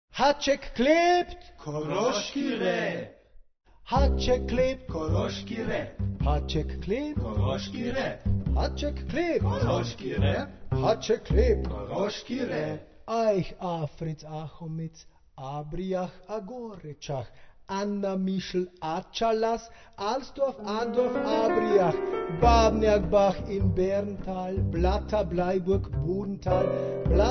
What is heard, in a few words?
koroski rap